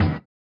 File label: SWUSH; Spectrasonics; Metamorphosis